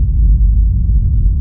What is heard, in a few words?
rumble; Golden Crayon Sounds